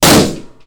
Gunshot

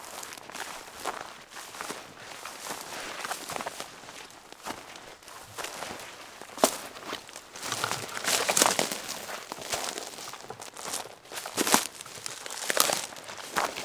Walk Scrub

